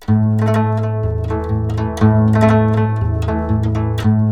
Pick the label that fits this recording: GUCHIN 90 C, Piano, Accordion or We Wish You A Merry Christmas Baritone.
GUCHIN 90 C